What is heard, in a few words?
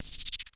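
Klone Dual Percussion Synthesiser LFO noise; KLONE FX NW8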